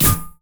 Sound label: prism wave ally; LoL; lux